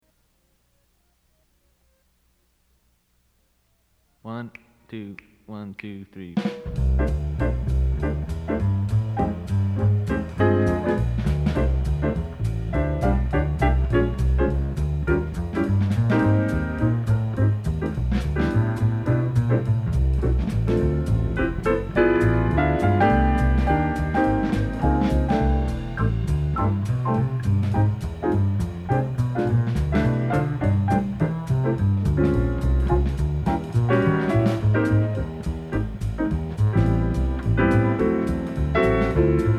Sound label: horns guitar; Jazz Studies Auditions; Play the melody one time and then improvise three choruses to the ending